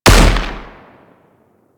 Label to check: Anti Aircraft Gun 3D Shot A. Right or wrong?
Right